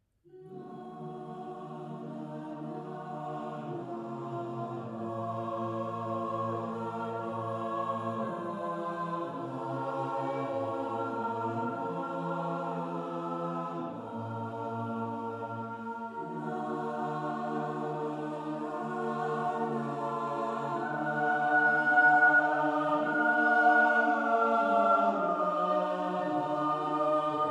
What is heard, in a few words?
lullaby